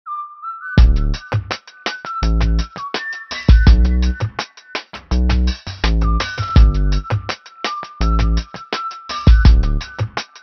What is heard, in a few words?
Whistling Rasta